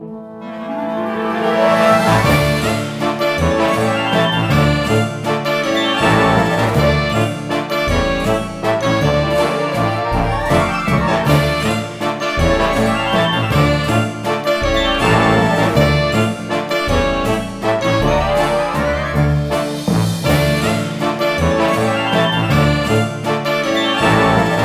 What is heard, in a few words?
Big Boo Appears